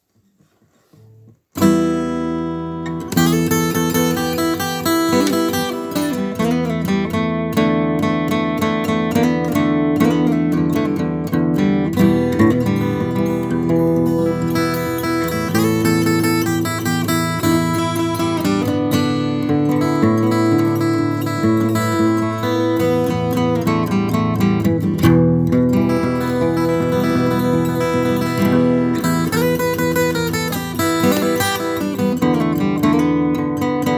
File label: Little blues in E